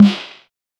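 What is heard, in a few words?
sharp drill snare one shot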